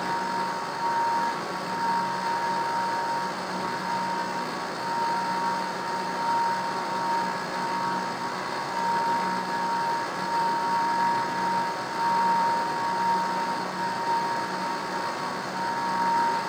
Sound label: autoturret movement loop; Rust